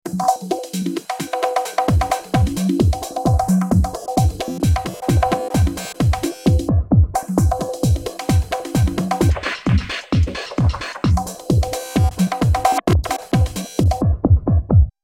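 Beats, This rhythm phrase is played back in combination with Event Mode and arpeggio.; V-Synth; RhythmCombo2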